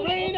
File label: rain